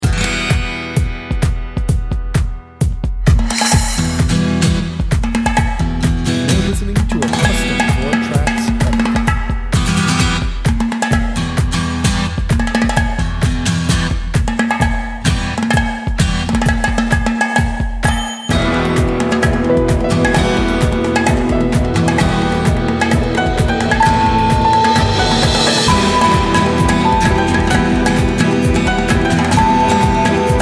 flourish

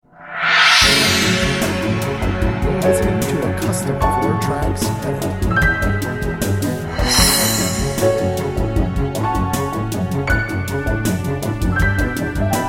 breaking beats

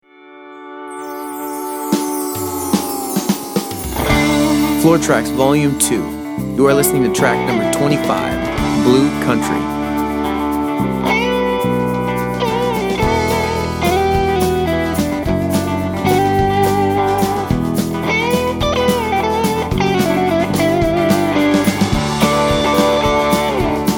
blue country

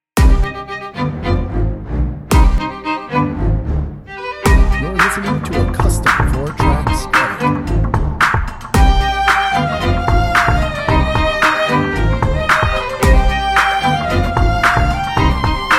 desert dance

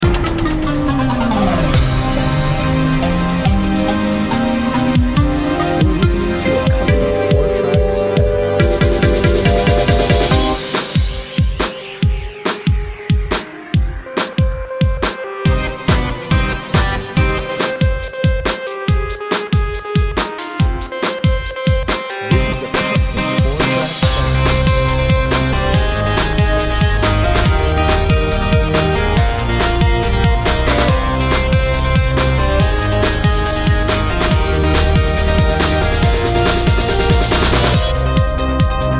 open spaces

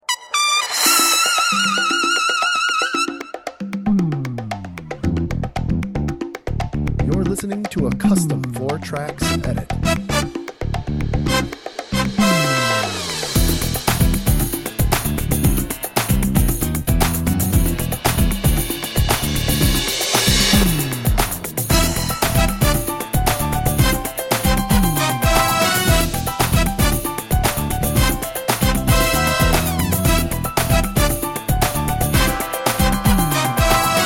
salsa blue

